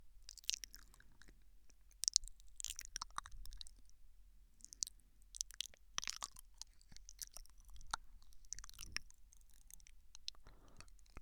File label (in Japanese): 左耳舐め, ASMR